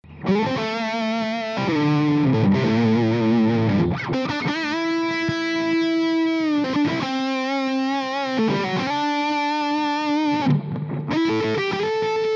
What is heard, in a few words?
Carvin Legacy Overdrive